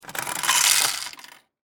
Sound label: Vending Machine, Industry, Vending, Machine, Candy, Turn, Handle, Dispense; Machinery Sound Effects; BlastWave